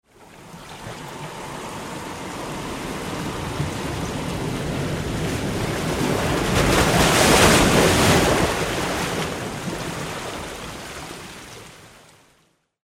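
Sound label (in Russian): высокая волна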